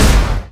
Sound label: small fire; Weapons; EK modern; Barotrauma